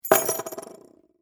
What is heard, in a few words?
Key Chain Drop, Set, Down; Foley; Jangling Sound Effects; Objects